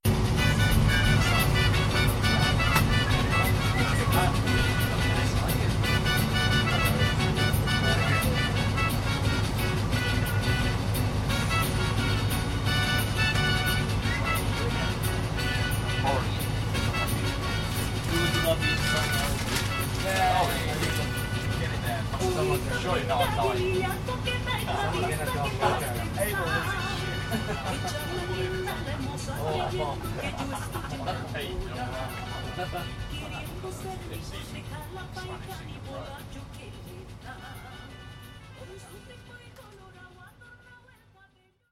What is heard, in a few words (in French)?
Bolivie Quijarro dans le train